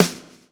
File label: lash, backbeat